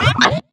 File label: Dodge; Robbit